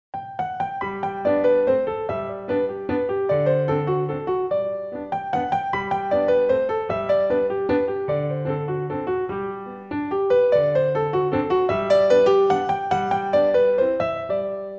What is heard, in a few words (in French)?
Valse en Sol Majeur, piano